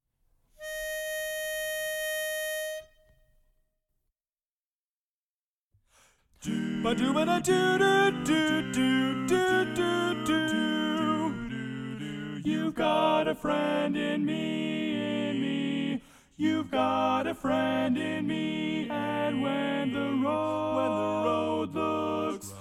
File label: Tenor